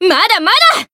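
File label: Voice attack, Japanese; kikyou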